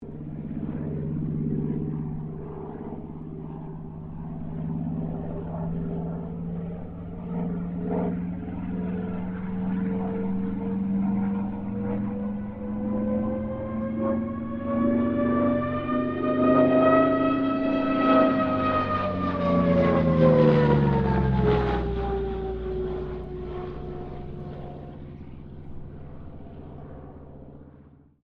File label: AIRCRAFT CA-13 BOOMERANG PASS STEREO